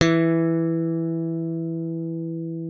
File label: thumb G E3, slap thumb; Xsample VOL-1; 90 s Sample CDs